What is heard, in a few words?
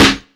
Snare Drum; Drums - Snare